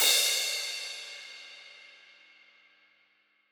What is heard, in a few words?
Crash Bliss; Percussion; Cymatics - Infinity Beta Pack 2.0; Zeus Percussion Collection